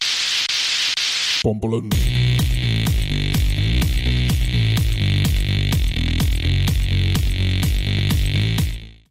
beats

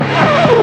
tire screech; meme

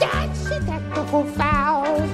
technical foul

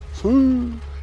siuuuuuuu susurro

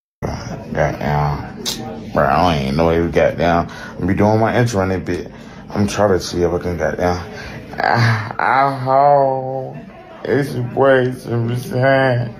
hurt

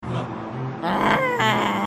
fiddle

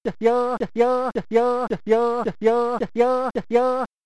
hyuck

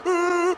uuuhhh; the rock

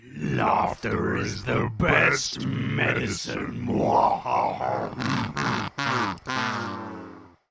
laughter

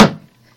wood impact

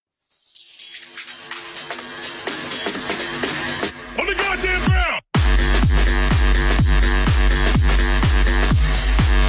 assault music; payday

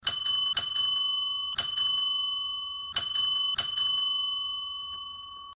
ring bell

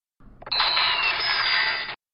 diary update ringtone; mirai nikki

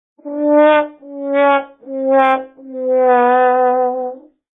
slowed down sad trombone

